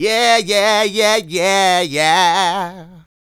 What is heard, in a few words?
Male Solo, Vocal